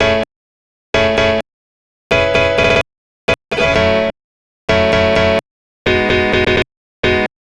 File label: piano chord